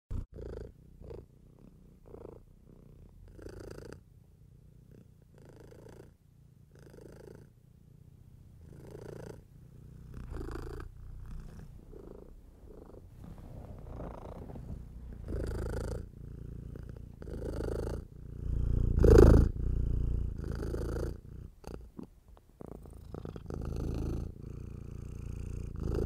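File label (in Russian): мурчание кота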